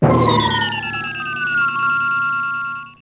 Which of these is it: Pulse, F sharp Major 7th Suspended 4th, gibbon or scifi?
scifi